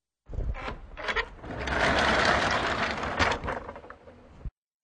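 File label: Door movement on rails; Dvizhenie dveri na polozkah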